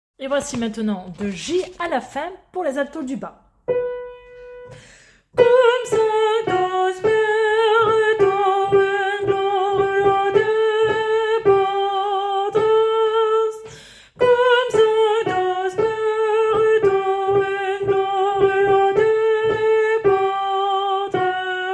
alto du bas; Gloria J a la fin

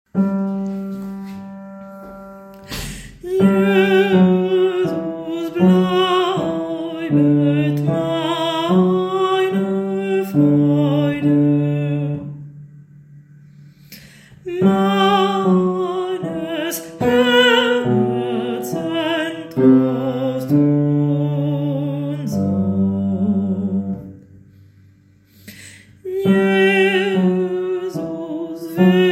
partie basse; Jesus bleibet

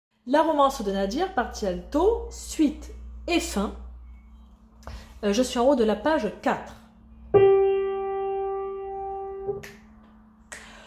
partie alto

